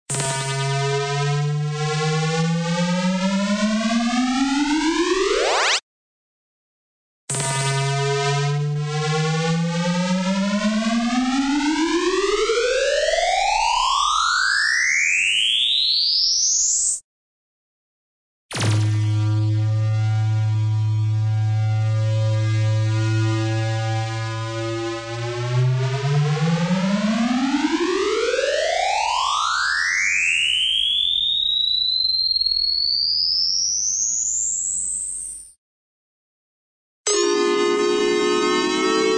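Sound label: electronic moog slide up, single; cartoon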